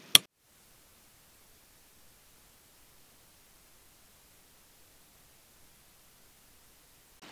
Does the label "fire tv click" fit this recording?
Yes